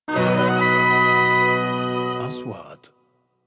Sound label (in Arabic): إنتقالة ختامية; sound effect; transitions